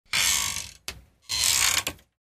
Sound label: wooden door creak